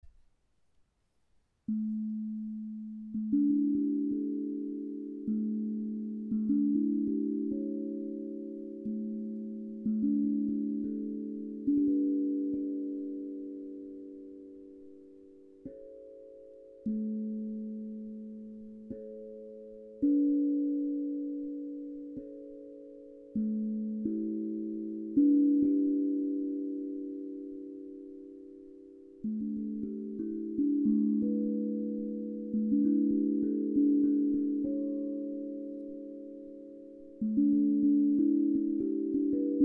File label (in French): baguettes